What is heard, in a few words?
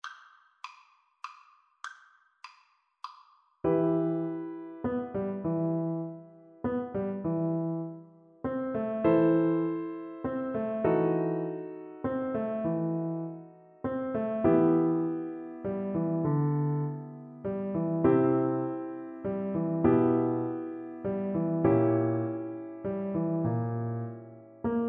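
VLC karaoke, Cello; bach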